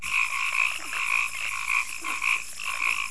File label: Frog